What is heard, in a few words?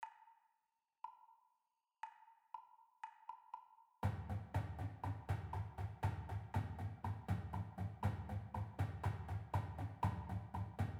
Battery cym. Perc; Battery no cym.; Tryout; rolla-bands